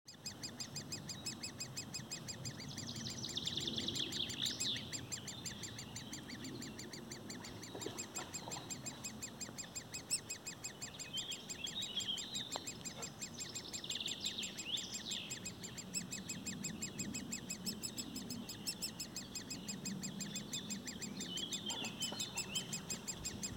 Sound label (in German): Specht